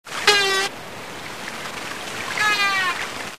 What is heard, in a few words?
risso